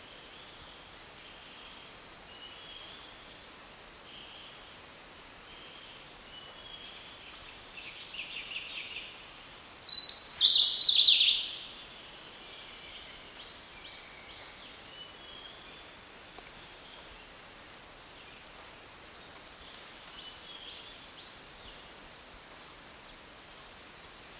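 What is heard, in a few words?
Slaty bellied tesia, bird; تسک شکم توسی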